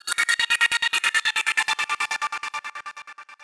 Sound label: Lead 140; Audacity; loops